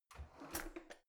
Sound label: old drawer creaky out half